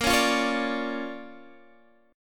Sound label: D Minor Major 7th Sharp 5th Bb, guitar